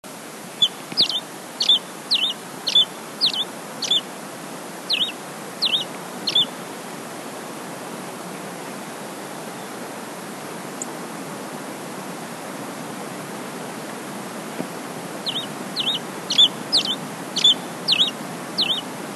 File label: black hooded sierra finch